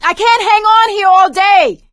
ledge hang middle; Left 4 Dead 2; producer

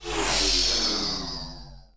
Fighter Run; Sid Meier's Civilization 4 Complete; Beyond the Sword; Final Frontier; Units

